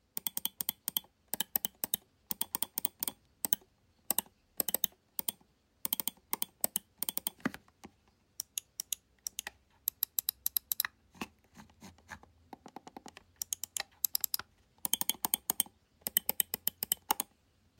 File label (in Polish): logitech g pro x superlight 2 dzwiek